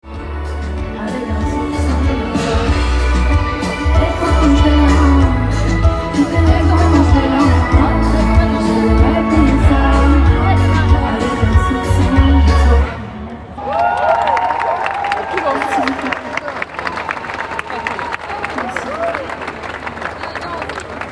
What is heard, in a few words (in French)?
concert